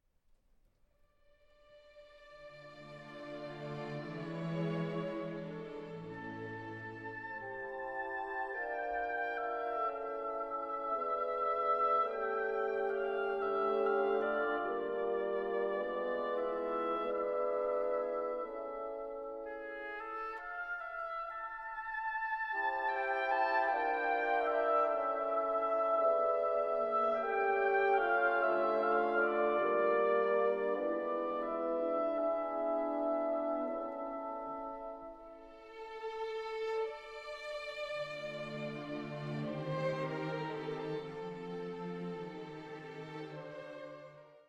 Piano Concerto in D Minor, Intermezzo - Adagio

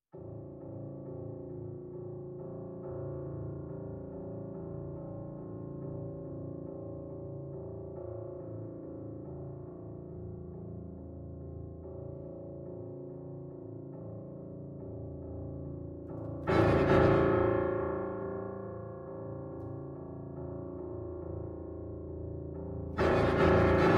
Toccata For Accordion & Piano

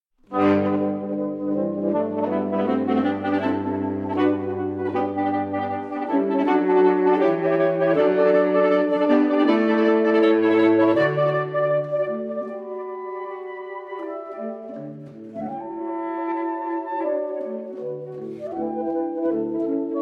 Saxophone Quartet; Holberg Suite, Op. 40; I. Präludium

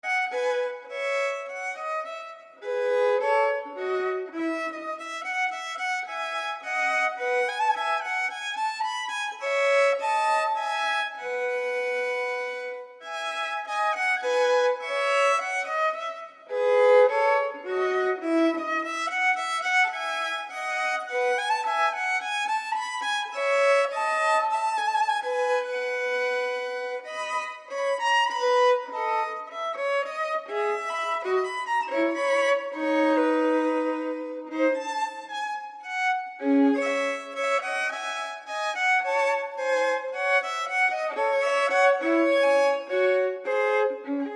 Violin Partita in E Major, Menuet; No. 3; BWV 1006

